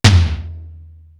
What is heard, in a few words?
POWER TOM; PROC.TOMS; East West; Global Sounds